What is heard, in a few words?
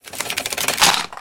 gunner reload; War Thunder